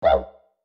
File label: Tribal MMC insert; Nokia Ringtones; Audio themes